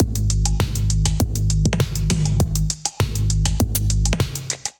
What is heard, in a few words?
Brand New Beats Kit Melodic 100 bpm, Drums; MIDI Clips; Drive and Glow; Ableton; Factory Packs